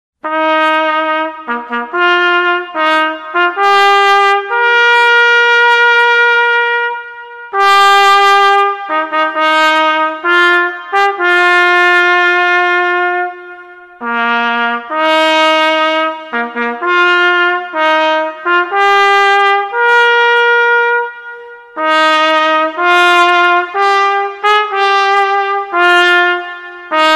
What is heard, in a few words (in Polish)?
hejnal